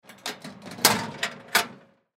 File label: Gym Locker Open, Gym Locker Open Door; Equipment; Sports